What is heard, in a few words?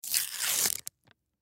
Bottle Water Rip, Bottle Water Rip Label Off; Ripping; Foley; Sound Effects:Objects; SoundEffects-Blastwave